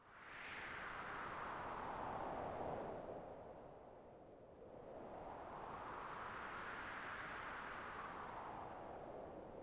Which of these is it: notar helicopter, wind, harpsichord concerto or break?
wind